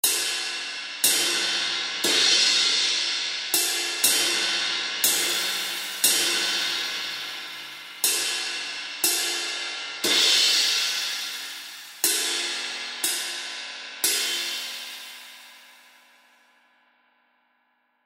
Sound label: Zildjian A Custom 21 Inch Ride Cymbal Loops